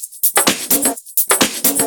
Electro Loop 128BPM; Vengeance Samples

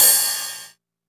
Ride, Cymbals

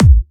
Bassdrums UK Trance Kicks

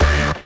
Synths D; vengeance essential club sounds-3

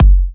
Kicks; Vengeance Samples

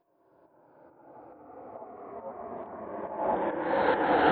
Soothsayer Kit 138 BPM Reverse FX; Sound FX; Bminor

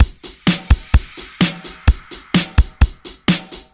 Housebeats, 128BPM; Vengeance Samples; VEH2 Loops

